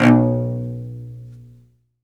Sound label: solo cello, strings; ILIO